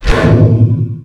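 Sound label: Laser Hit Interior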